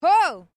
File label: FEMALE EXCLAIM; Female vocal sounds; Exclamations; Sound Effects:Voice; Foundation3